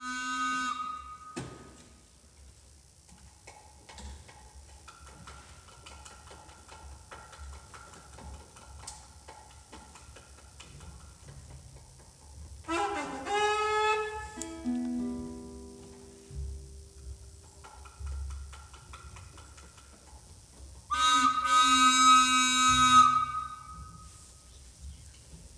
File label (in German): Geraeuschmusik Die alte Lokomotive